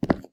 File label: crunch footstep; Camas